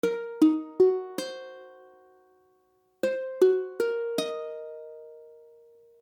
Ebm6, ukulele; ukulele akordy

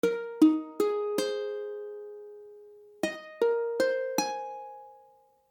Abadd9, akordy ukulele

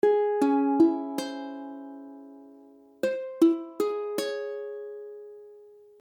Cismmaj7, ukulele; ukulele akordy; C#m(maj7)

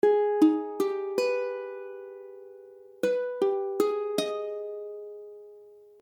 Abmmaj7, ukulele; ukulele akordy

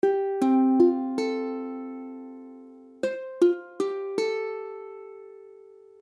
Fadd9, akordy ukulele

